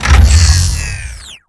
gauss siege stop; doom gauss

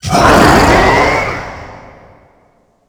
shout angry; glasgavelen

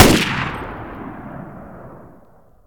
turret shoot close

